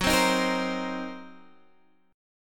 C Minor 7th Flat 5th, F sharp, chord

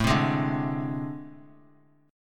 A Minor Major 7th Double Flat 5th, down, Guitar; strummed; JGuitar

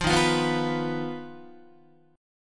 D sharp Suspended 2nd Sharp 5th D sharp Guitar; strummed; JGuitar

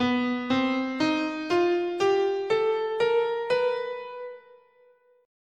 B Enigmatic

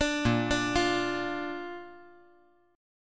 A sharp Major

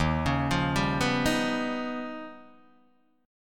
D sharp Augmented 9th D sharp Guitar Open D

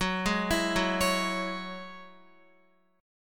Gb 7th Suspended 2nd, Guitar, Gb7sus2 chord; arpeggiated; JGuitar

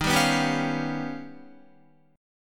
Eb Augmented 9th Eb down Guitar; Eb+9 strummed; JGuitar

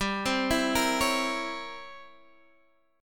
C 7th Flat 9th G

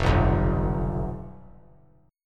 F Suspended 2nd Flat 5th, Fsus2b5 chord; strummed; JGuitar

